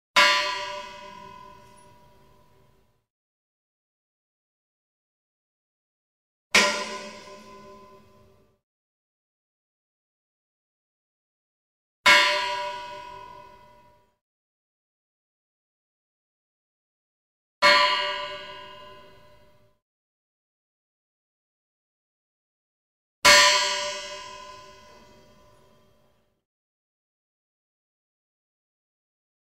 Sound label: Metal Pan